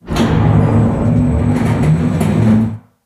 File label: Elevator Loading